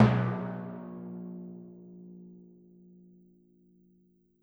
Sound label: Timpani, Orchestral Percussion One Shots; Sonic Foundry (Sony Creative Software); Orchestral VOL-2